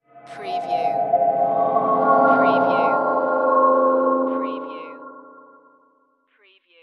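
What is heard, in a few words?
Production Element Feedback; Sound Effects Bible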